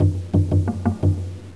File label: enter